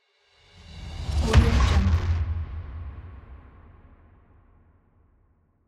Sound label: hybrid cinematic impact